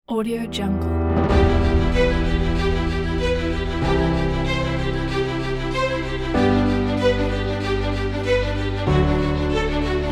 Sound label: the inspiring epic